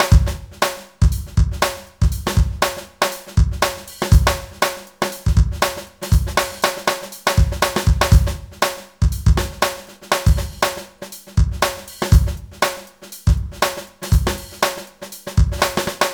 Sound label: BASSTARD 120